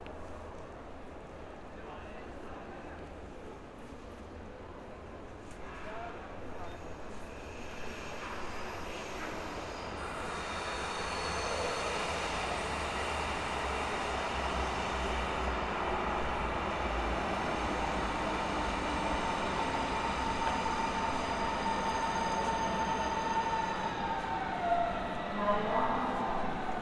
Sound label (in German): Bahnhof Berlin Alexanderplatz